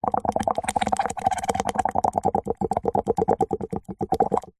food drink milkshake thick blow bubbles through straw in glass